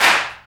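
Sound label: CLAP, right; East West - Phat & Phunky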